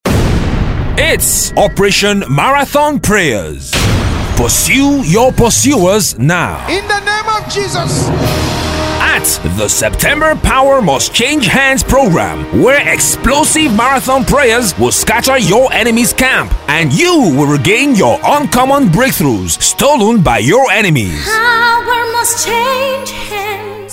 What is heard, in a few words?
Radio Jingle